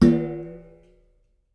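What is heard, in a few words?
water pan; Spectrasonics; Liquid Grooves; POTS + PANS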